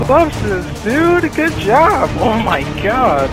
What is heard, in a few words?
dud; subzstance